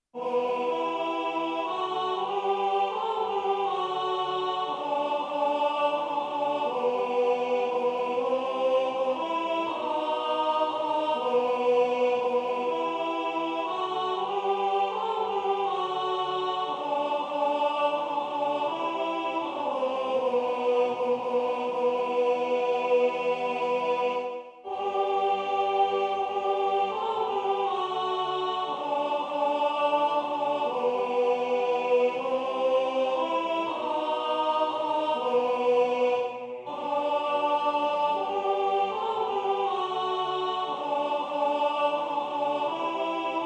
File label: Greensleeves alto; Chants